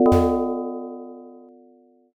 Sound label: prison door